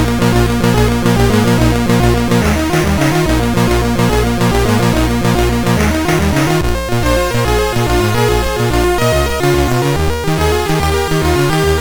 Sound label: Mini Melodies, SID CSG8580R5